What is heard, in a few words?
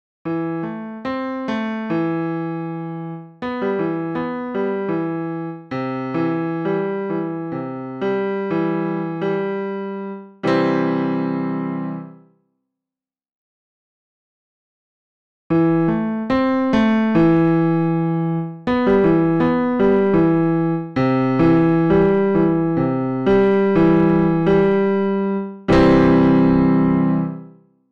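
Piano saturation; Trident; Softube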